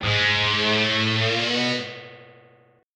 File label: unlimited Over Bend